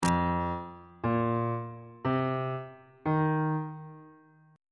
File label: f dominant 7th suspended 4th chord intervals on bass clef